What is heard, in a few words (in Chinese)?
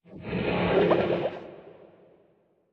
骷髅马水中嘶鸣声